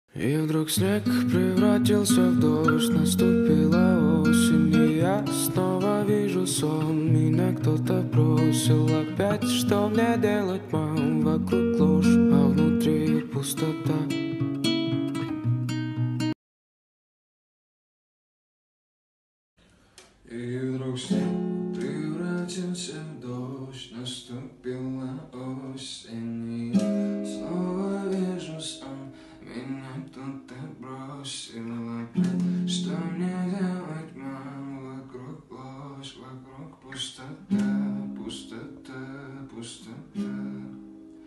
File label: Pustota Acoustic Live